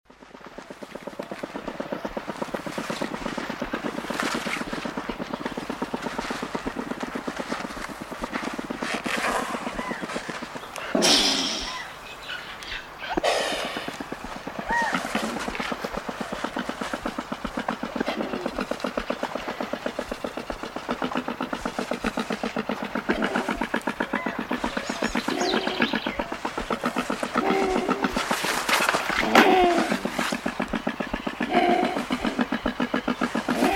Andean Condor Sound

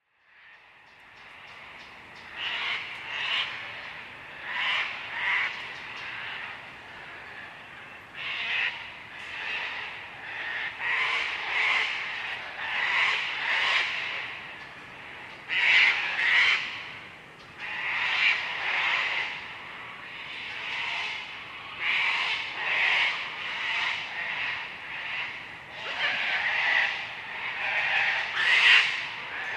Cockatoo Sound

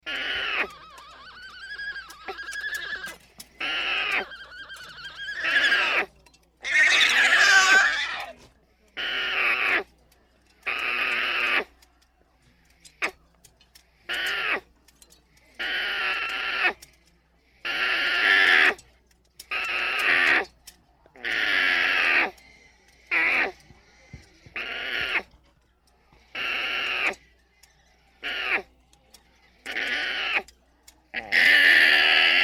Alpaca Sound

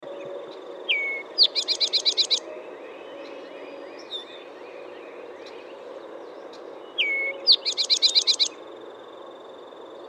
Towhee Sound

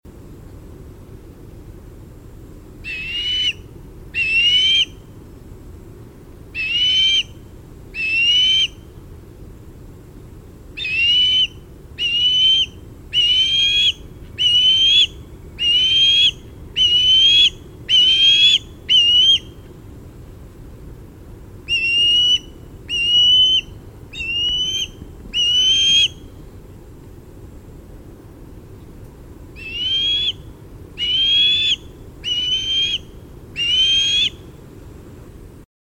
Osprey Sound

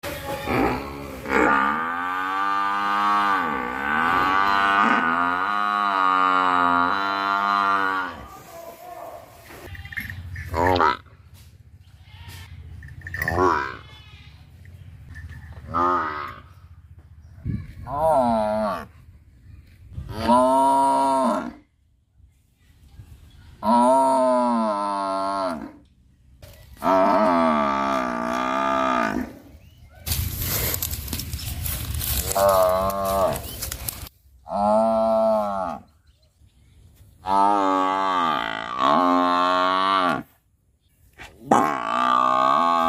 Buffalo

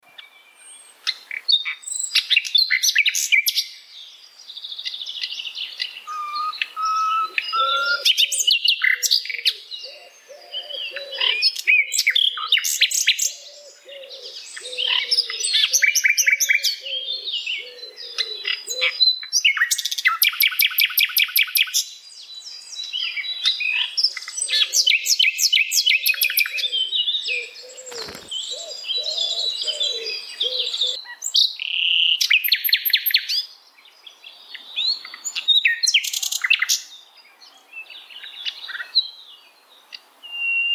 Nightingale Sound

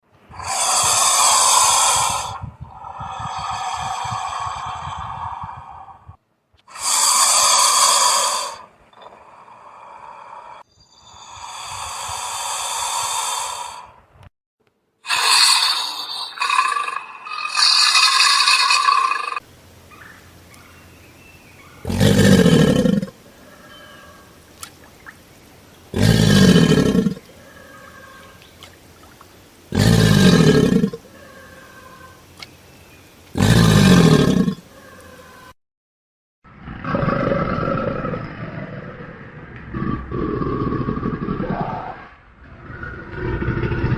Alligator

